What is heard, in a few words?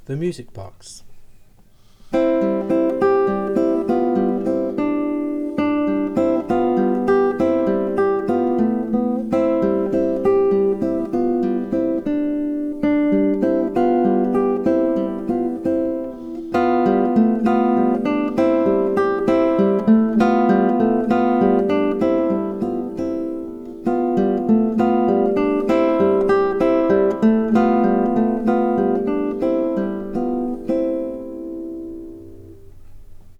Music Box